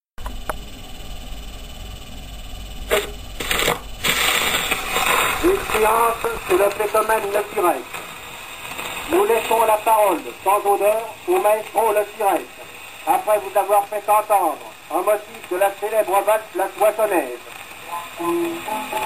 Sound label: farts